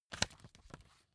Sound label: magazine flip back fast